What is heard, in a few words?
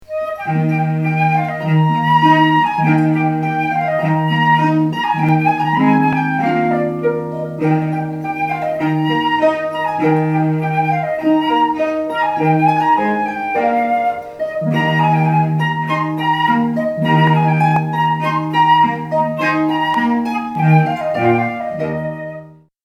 Allegretto from Spring - Flute, Cell